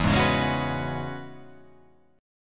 E 6th Add 9th E down

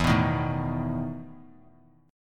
E Major 7th Suspended 4th; strummed; JGuitar

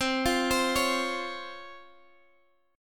Db Major 7th C

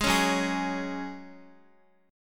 Gb Suspended 2nd, Guitar 4E,4A,5D,5G,5C,6F; Gbsus2 strummed; x,x,6,6,6,3; Gbsus2 chord

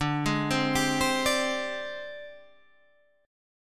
Ab Major 7th Flat 5th D

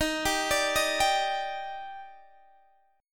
Eb Major 7th, Guitar DADGAD; down

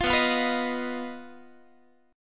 B Major Flat 5th B Guitar; strummed

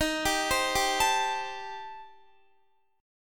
D sharp 6th Flat 5th, D sharp, down, Guitar; arpeggiated; JGuitar

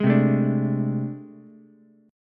A Minor Major 11th, down, Ukulele; strummed; AmM11; JGuitar

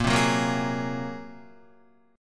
Bb 7th Suspended 2nd Sharp 5th Bb, Guitar; Bb7sus2#5; strummed; 6,3,4,3,6,3; JGuitar

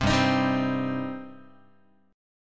G Suspended 4th Sharp 5th, Guitar; Gsus4#5 strummed; JGuitar

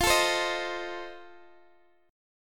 F 7th F down Guitar Open D

